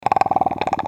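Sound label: wait, basilisk; basalisk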